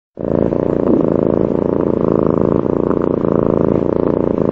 Cat Purr

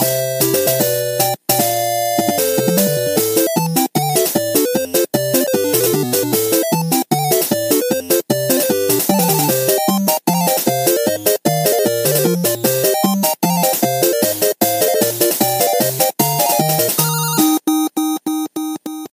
victory delight

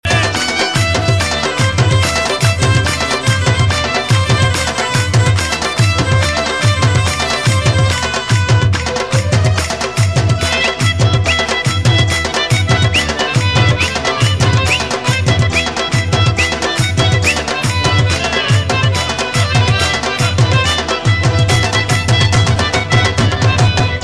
Pashto

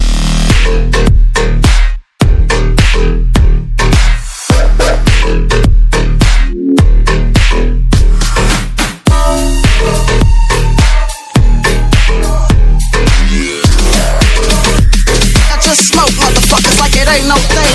Trap; Gangster

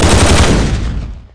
Machine Gun

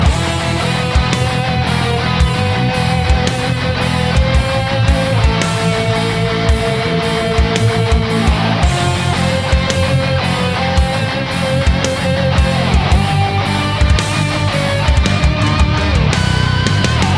Metal; Dexter

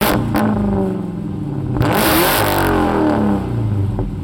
Dodge Challenger Rev

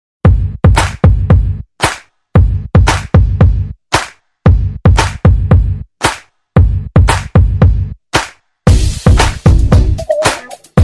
Cool Cool Bass

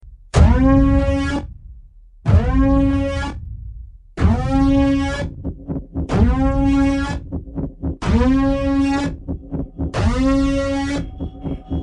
Alert; Transformers 3

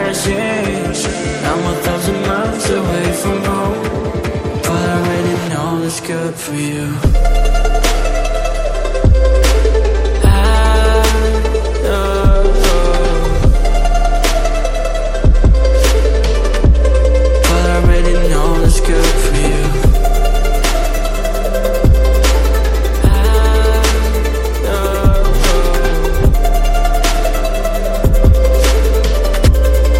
iPhone XS Ringtone

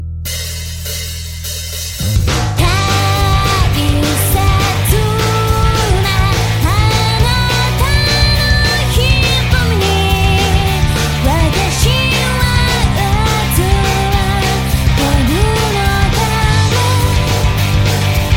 Chorus

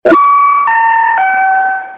Indian Railway

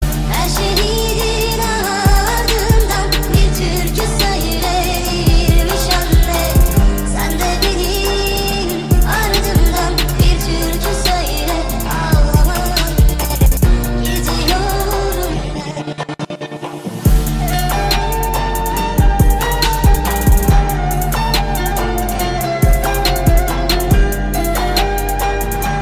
Turkish Trap

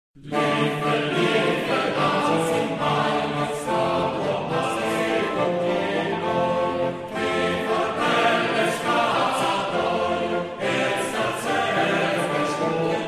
Gothic Choral

